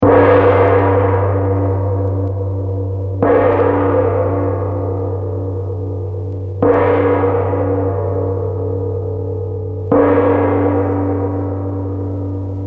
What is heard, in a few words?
Gong Seven Strokes; Musical